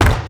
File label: giant scout step; Garry's Mod; tf2; mvm